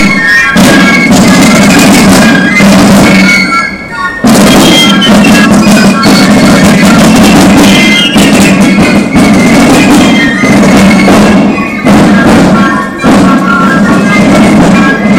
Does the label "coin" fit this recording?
No